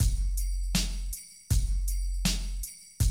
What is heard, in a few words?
Classic DrumLoops; Best Service; XXL 1500 Classic DrumLoops